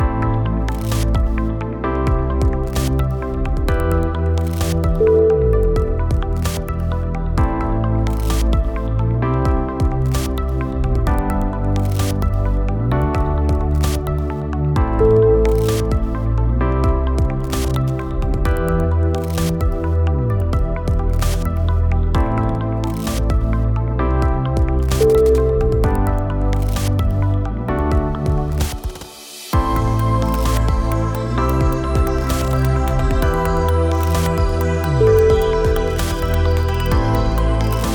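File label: glitch emotions, music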